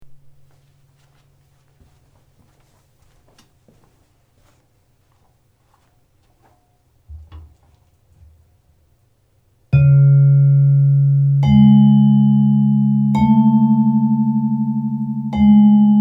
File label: Vibraphone Bar Whine